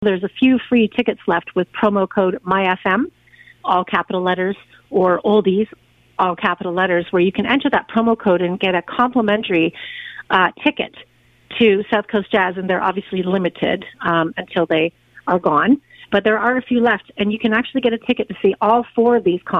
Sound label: south coast jazz